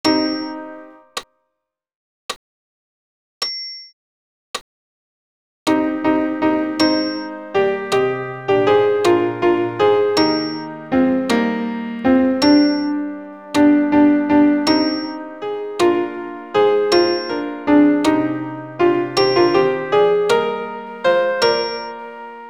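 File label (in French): Credo alto piano accompagnement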